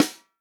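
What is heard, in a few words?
snare drum, Real Drum Kit; Best Service ProSamples vol.43; POP KIT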